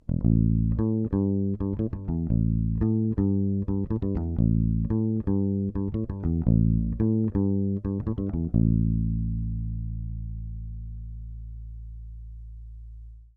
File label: Bass Cut Treble Flat